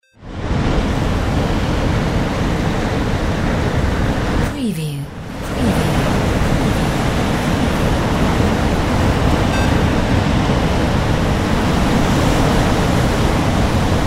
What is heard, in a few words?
SEA ROUGH